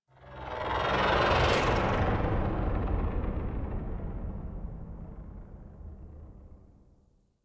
Ship By; Warframe; The New War; Zariman; Apartment

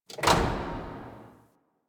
Worker Lever Pull; ambience; props; one offs; Warframe; Venus Open World; Venus Solaris United

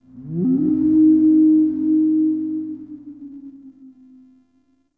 Exterior Eerie; Warframe; Ambience; Duviri

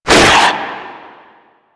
Proto Infested Runner Arm Attack; Warframe; Abilities

